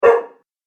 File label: Dog Sharp Bark; Dog Sound Effects; animal sound effects